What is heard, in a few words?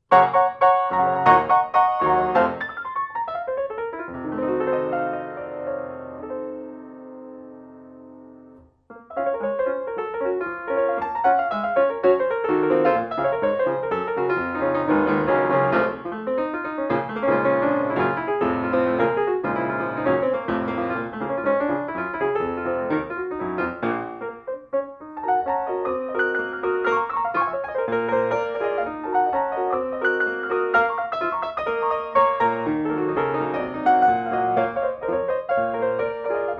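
Jazz, piano solo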